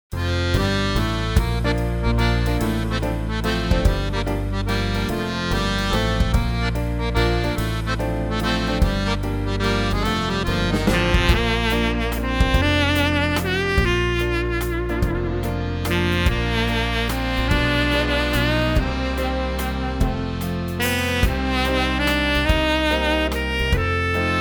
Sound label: Waltz